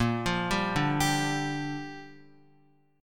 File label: Bb 6th Bb, Guitar; Bb6 arpeggiated